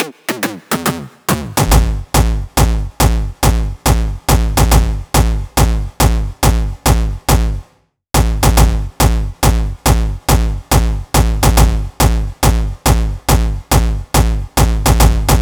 Kick, 140 BPM; Rap; Vengeance Samples; Vocal-Hook Kits; Song Kit

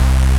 Bass C, Offbeat Bass

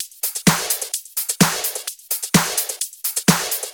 Houseloops 128BPM; Vengeance Samples

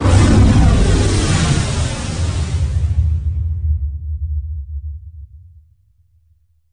VFX Atmos

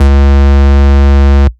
Vengeance Ultimate Bass C#, Root C#

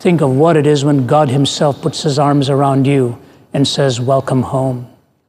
Vocal Phrases; Vengeance Samples; Vocals

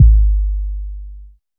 Bass Drop, 808; Street Anthems Sound Kit

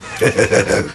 LoFi Vocals Oneshots; Vengeance Samples

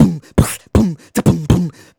Beatbox Loops, Human Beatbox

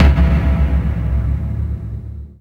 Impacts

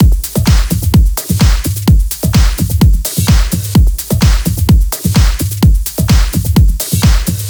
128BPM Kit; Vengeance Samples; One Stop Kit

